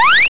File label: jump; Lynda C Game Programming 2